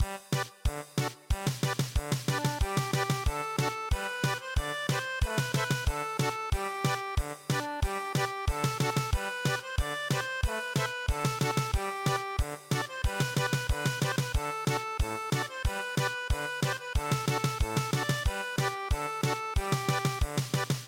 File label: German Polka